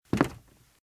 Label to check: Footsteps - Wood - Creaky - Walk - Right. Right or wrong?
Right